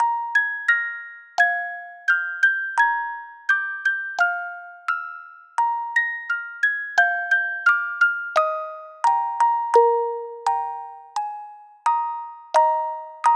Music Box

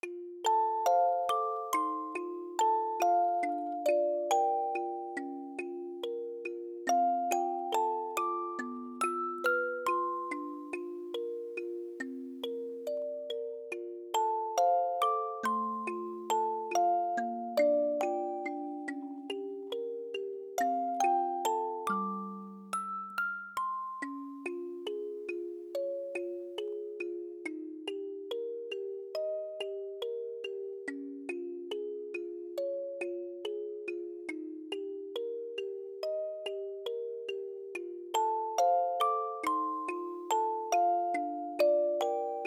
Music Box

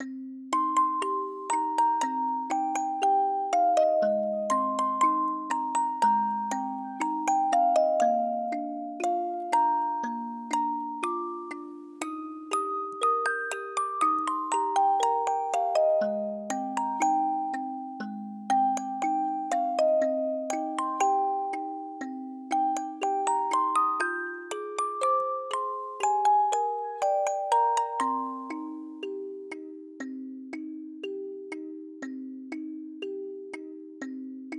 Music Box

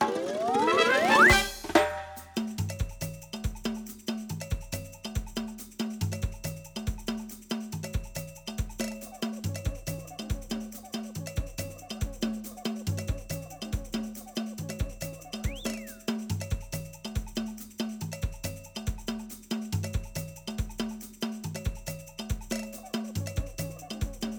Accomplishment Fanfare

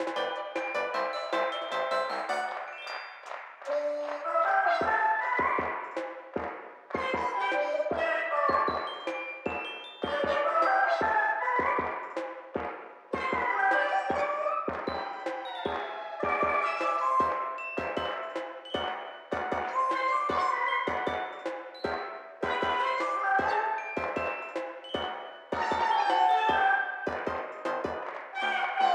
Music TV Show

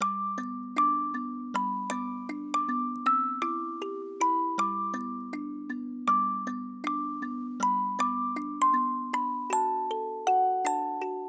Country, Music Box

